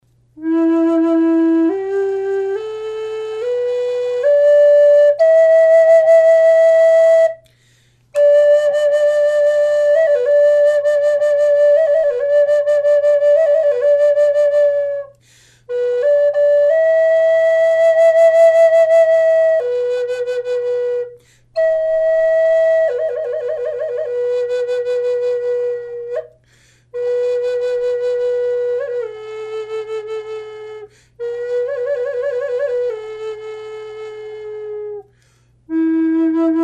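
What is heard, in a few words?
natural, flute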